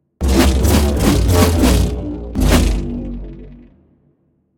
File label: combo sword layer; smite; god arthur